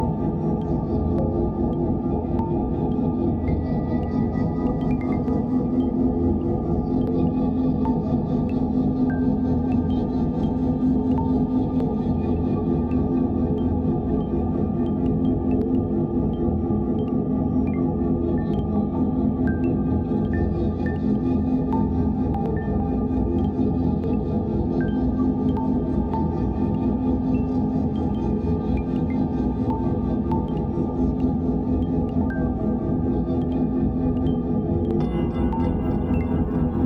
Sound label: 432 Hz